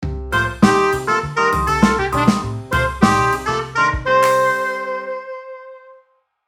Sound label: Cheerful upbeat melody sitcom music